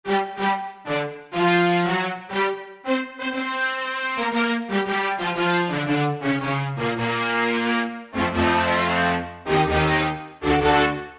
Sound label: Real Brass; Factory Preset examples